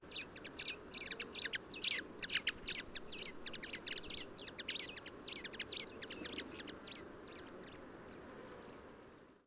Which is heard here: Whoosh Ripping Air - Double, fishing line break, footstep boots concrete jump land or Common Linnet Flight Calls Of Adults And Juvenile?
Common Linnet Flight Calls Of Adults And Juvenile